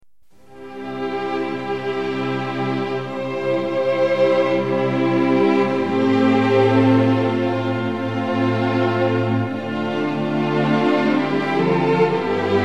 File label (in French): hymne national luxembourg instrumental